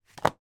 game monopoly game card pack place down on playing board